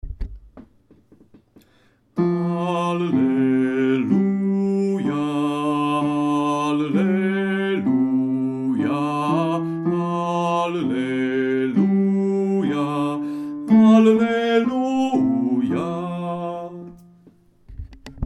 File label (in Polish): Alleluja bas